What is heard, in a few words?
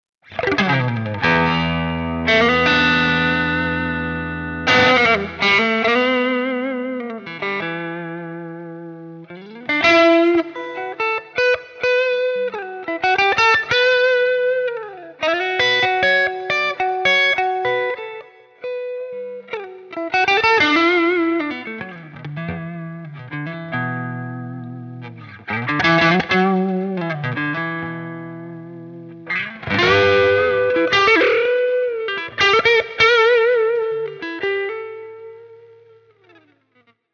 Mid Gain Wet; Archetype Petrucci